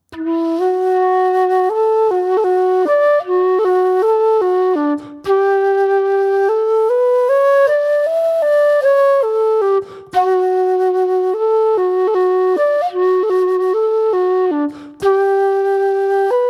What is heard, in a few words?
Slowest; Rambling Pitchfork